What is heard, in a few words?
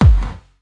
prophet Kick Reverb; Prophet with Processing; Hip Hop; style kits